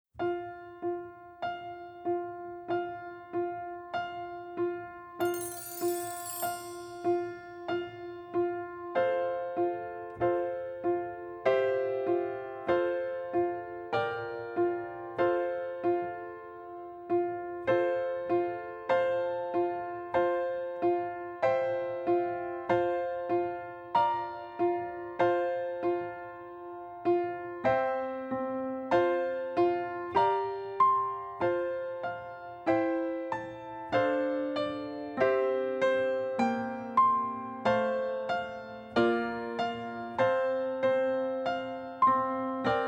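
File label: accompaniment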